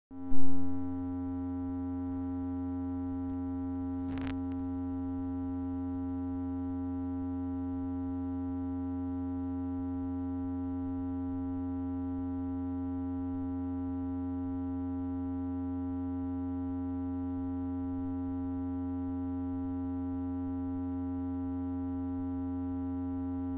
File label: VCO VCA MIX; SCOPE